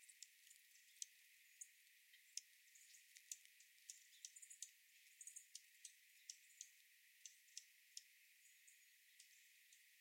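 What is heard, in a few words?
chips, dark-eyed junco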